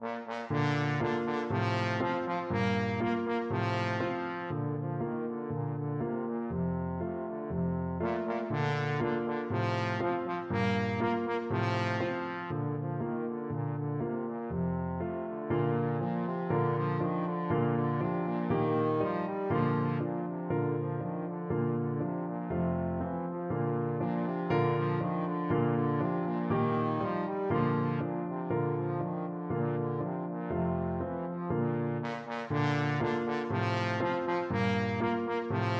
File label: beethoven ecossaise, trombone